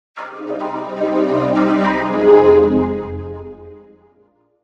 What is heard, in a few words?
in Strings